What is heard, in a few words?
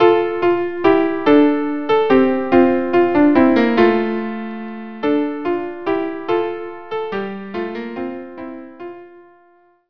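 violin violin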